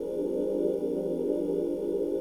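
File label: ui holograph ambience cybernetic loop; Stellaris; paragon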